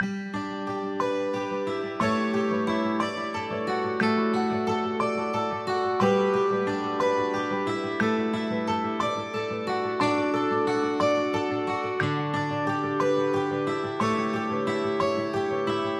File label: plucked guitar